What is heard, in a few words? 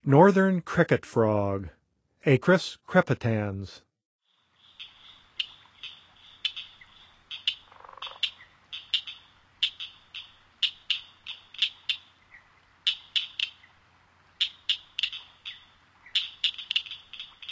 Northern Cricket Frog; N. America; Songs of Frogs & Toads of North America